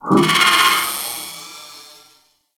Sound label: Oro Reactor Core Small Stop; Warframe; Orokin